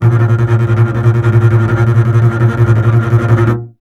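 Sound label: STR CBS, Solo Instruments, double bass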